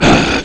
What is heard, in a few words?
monster grunt